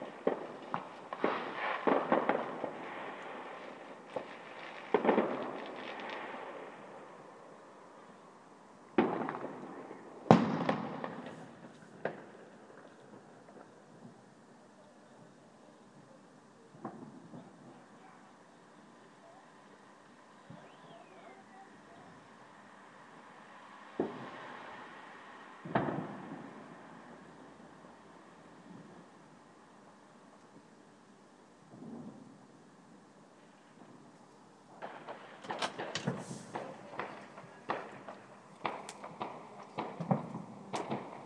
fireworks still thumping

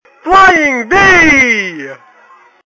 duck

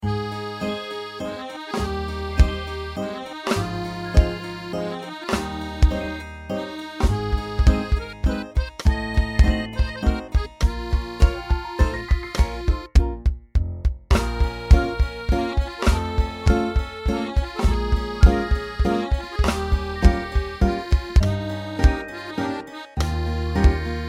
3:4 Waltz

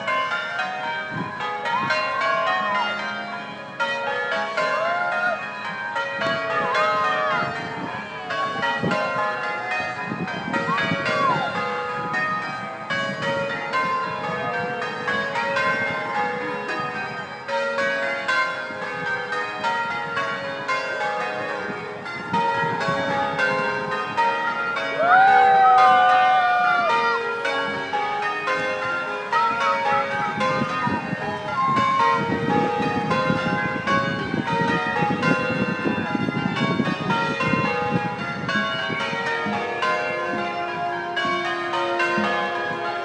jubilee bell ringing barge

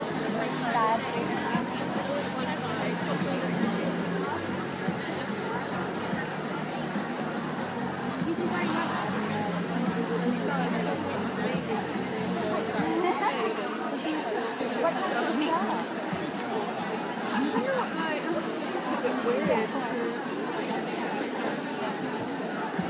buzz

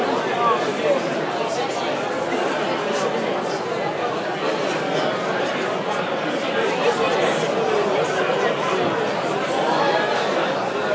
30 seconds of bradford beer festival ambience

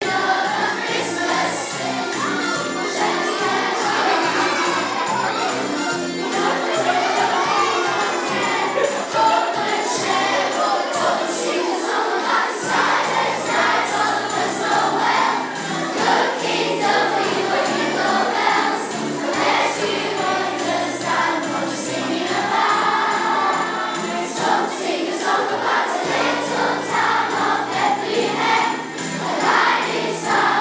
sound of christmas; lowerplace

